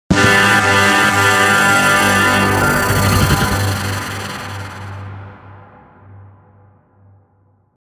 Final Goal Scored Jingle; Metroid Prime Blast Ball; 3DS

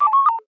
Scifi R2D2

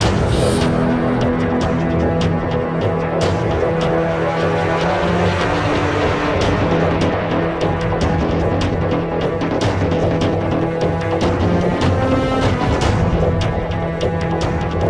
Mx Bridge Fight Lp

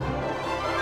Music Generic Heroic 144bpm Start; Asterix & Obelix Slap Them All; PS4 PS5 Switch Windows Xbox One

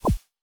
Dialogue Box Shrink; Boutique Boulevard

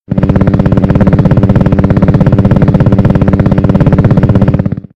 Propeller; Doodle Jump; 3DS; Android; Xbox 360

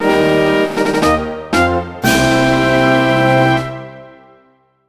JINGLE Victory; wii party the unofficial soundtrack

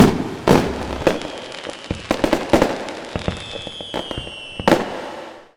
arena fireworks; Pix the Cat

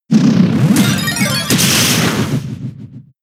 Spit Up; Pokémon SFX Gen 6 Attack Moves; XY ORAS

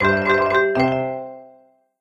Gacha Reward

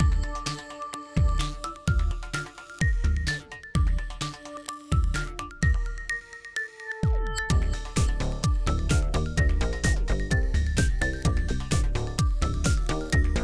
Music Winning; Kill to Collect; windows gamerip 2016

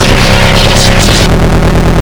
Mech sound; Cruelty Squad

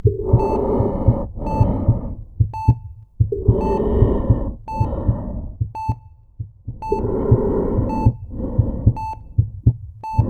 Ventilation Breathing Mask; Dream; Hevn

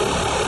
Glitch; gamerip; Doki Doki Literature Club

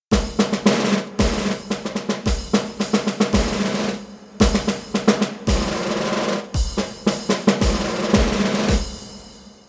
Music Main Menu; Army Rescue; Wii

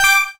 KEY Good Hit

